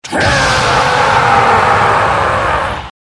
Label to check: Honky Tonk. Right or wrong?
Wrong